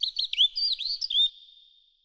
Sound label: Forest bird; ImpGal2; colony